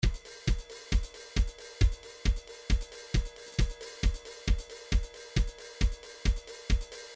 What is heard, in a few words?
trance midi loops beats flange; Newtronic Trance Constuction Kit